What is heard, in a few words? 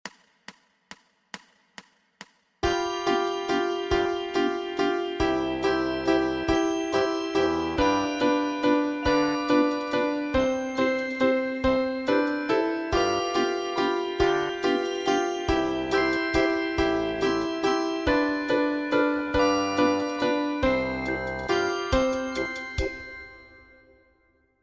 Chopsticks Melody